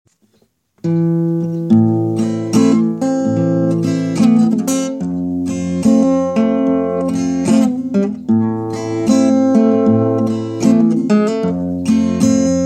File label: blues